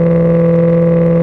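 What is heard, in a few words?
engine exhale, advanced engine sounds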